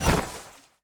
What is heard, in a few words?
Dash; bloom minigame